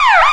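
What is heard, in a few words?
emergency vehicle yelp, sirens; eq2b; gmod; sgmsirens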